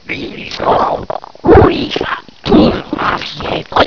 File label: chat